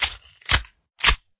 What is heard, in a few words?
pistol reload